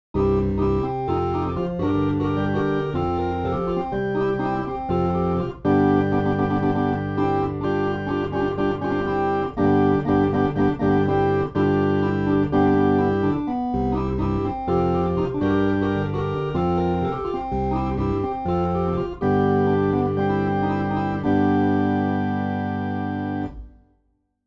groovy organ

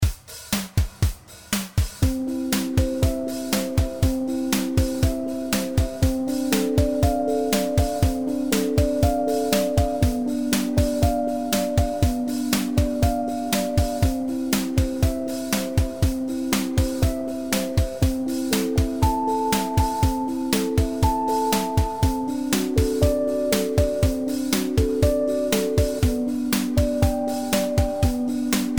Practising BACH

